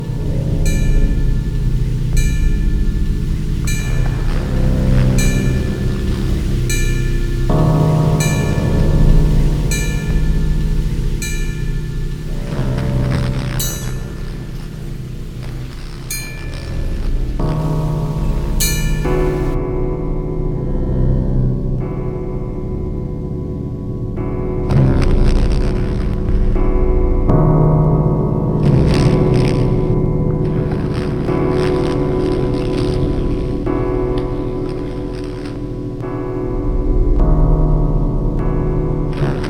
Hells Fly

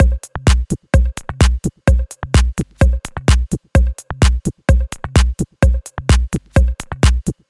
128 Bpm Micro Funk Beat

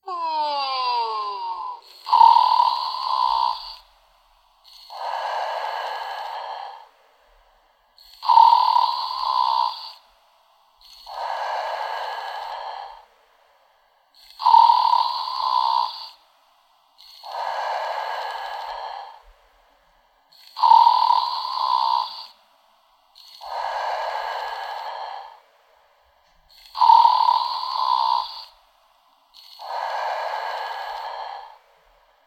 Bot Sleeping; Eindhoven

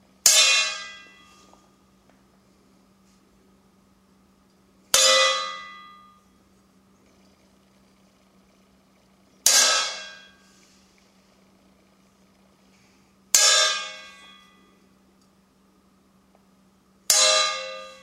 Hitting A Trowel With A Metal File

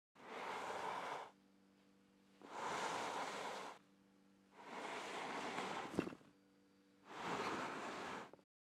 Dragging Body

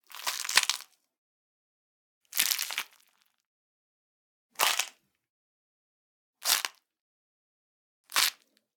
Flesh Manipulation; Kvv Audio

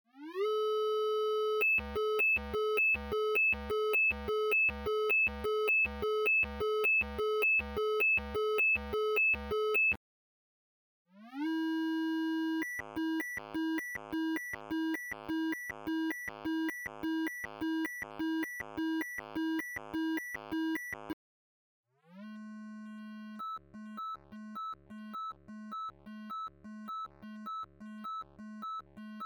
Alarm Sound

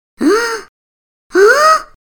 Gasps

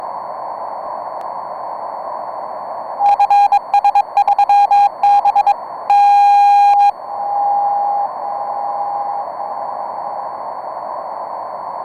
Beacon

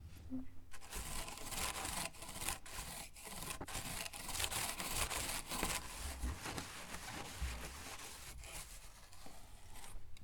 Painting On Plastic Wall Table

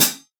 A Custom Hi Hat Cymbals Pedal Chic; Zildjian

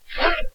Monster Pain

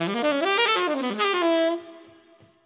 ii V7 I 4beat D maj 90

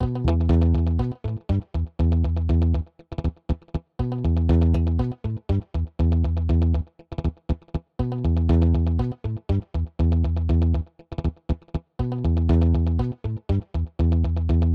Marching Bass Loop

